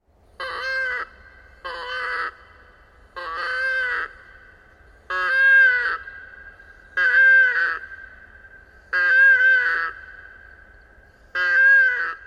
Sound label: baby fue